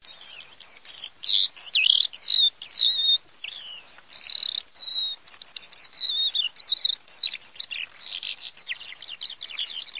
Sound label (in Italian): ALLODOLE